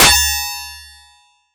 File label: PRC, Yamaha TX7 synth drums